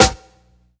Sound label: prominent acoustic snare c key